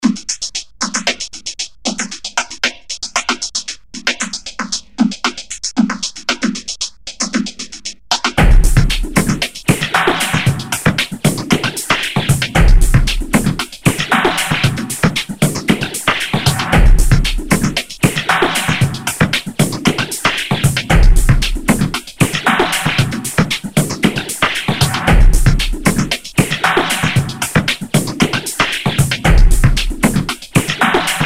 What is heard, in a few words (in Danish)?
Glad drama musik; backstage dramastykket